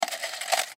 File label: Paper Clip Grab From Box; Miscellaneous Office; Blastwave